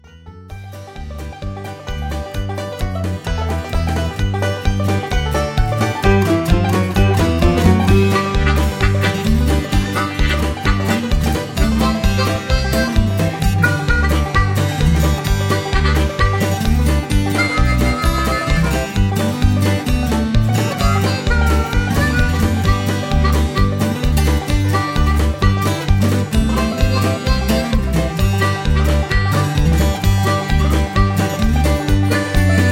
Instrumental

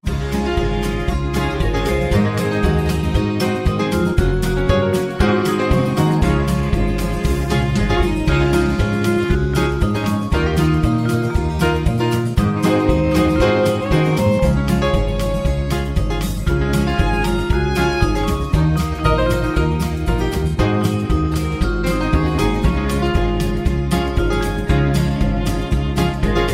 High Key

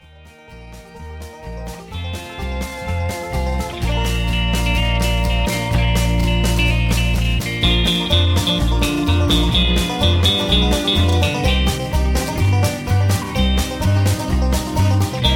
Instrumental Higher Key

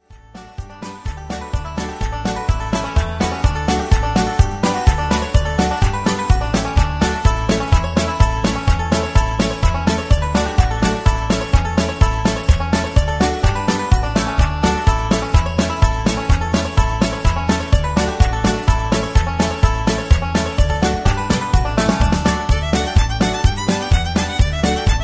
Patter

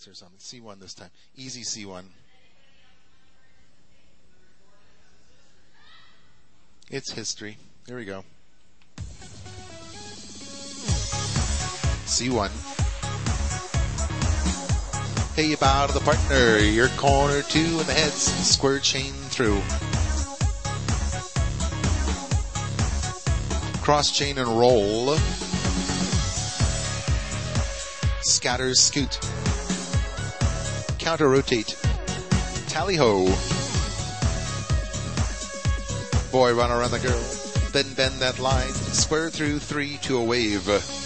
Patter

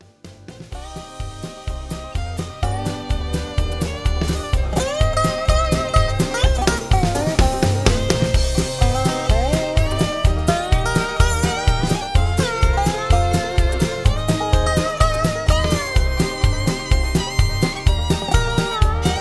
Instrumental

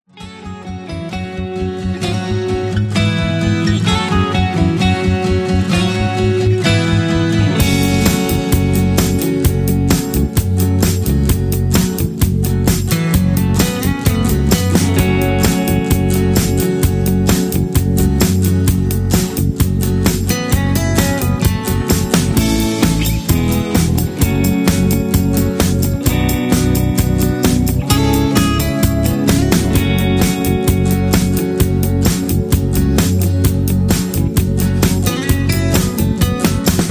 Instrumental No Leads

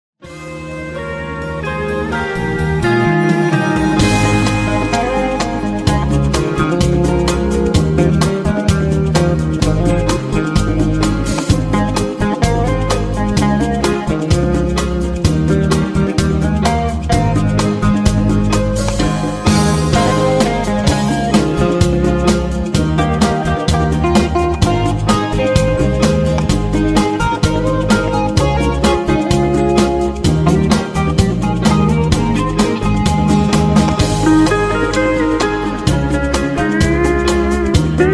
High Key

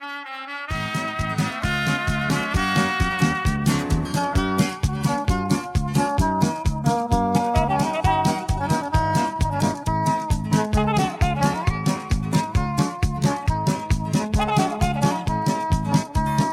Instrumental Low Key

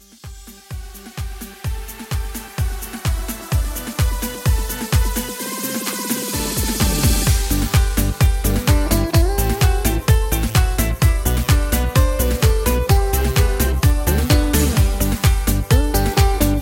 Instrumental

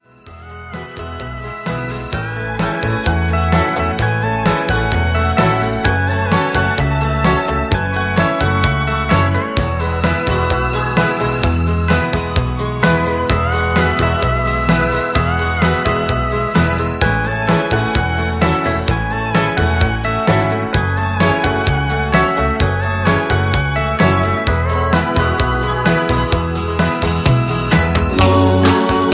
music with strong lead

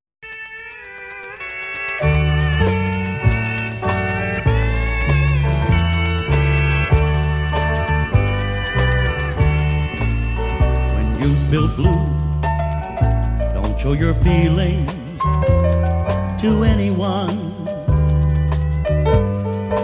Rhumba Instrumental

